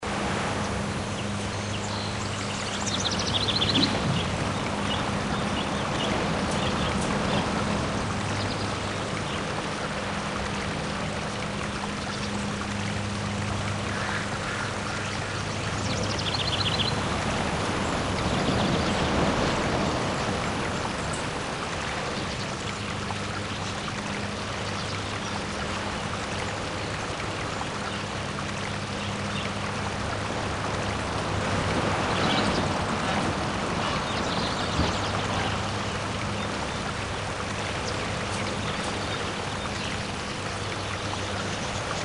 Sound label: sleep; Eld